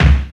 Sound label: BD, BD Dance